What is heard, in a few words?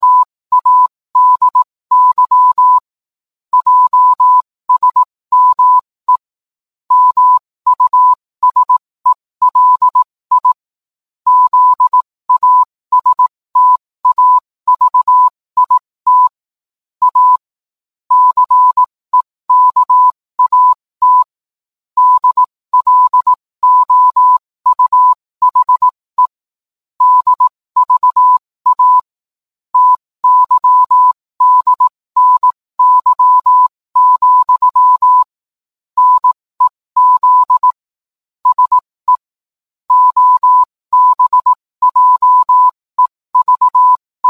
morse